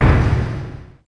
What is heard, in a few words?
Menu Command; Unreal